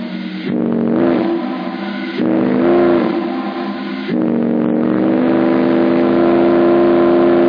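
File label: engine sound and steam release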